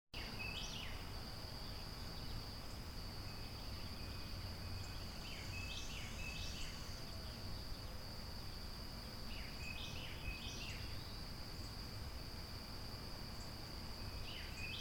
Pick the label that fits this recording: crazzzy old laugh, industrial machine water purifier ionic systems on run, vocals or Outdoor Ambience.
Outdoor Ambience